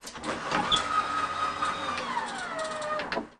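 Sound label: front door open, volvo 9900; Freyfurt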